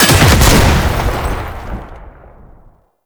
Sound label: Cannister Explosion; Enemies; Abilities; Warframe; Tau; Dax Archer